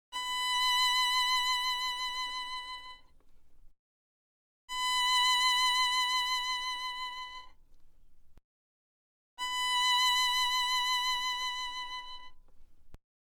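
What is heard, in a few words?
six repetitions of the same note by the violin (high consistency) and tenor (low consistency); last note of the theme at tempo 68.6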